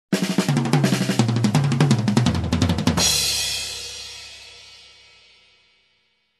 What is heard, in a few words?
Fast snare and tom drum fill